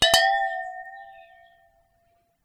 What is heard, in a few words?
Water Clink, Glass Clink In Water